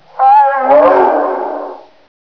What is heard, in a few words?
howl; rebar